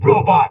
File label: VOX VOX, Electro Vox